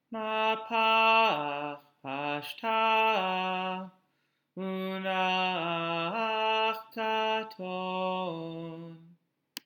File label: Torah Trop Pattern